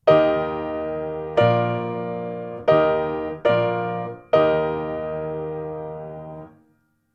akkordfolgen

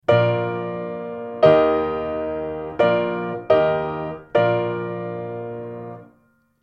akkordfolgen

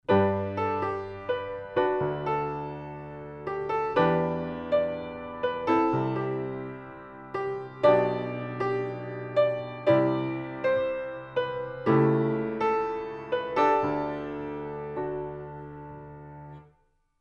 Oktaven Chords zur Klavierfassung mit Melodie

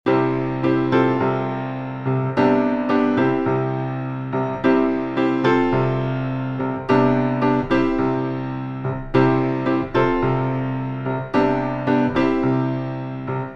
Synkopen

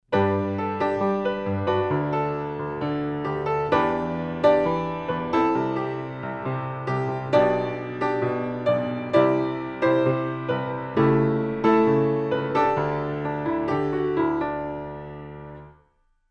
Endfassung zur Klavierfassung mit Melodie

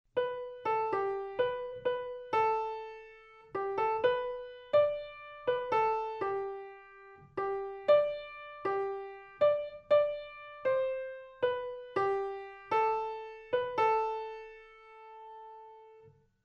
Melodie zur Klavierfassung mit Melodie

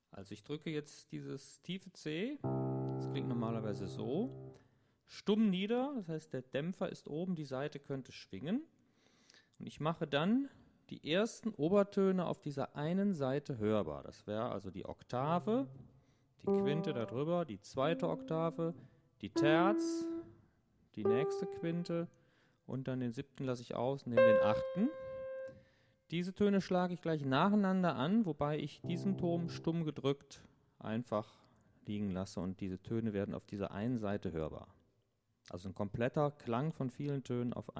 obertonreihe

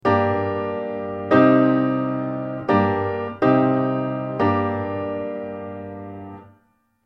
akkordfolgen

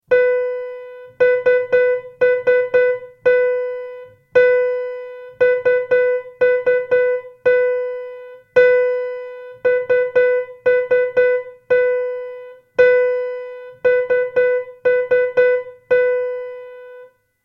zweitaktige Rhythmen

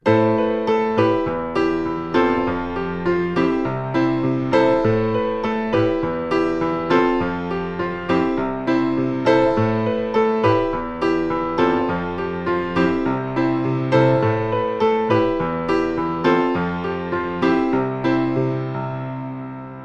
Synkopen

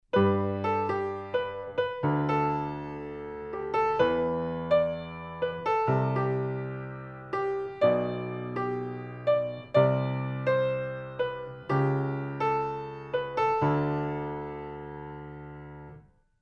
Oktaven zur Klavierfassung mit Melodie

